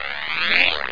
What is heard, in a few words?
shock; Interactive Media Design Review 1999